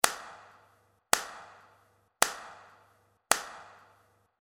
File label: Claps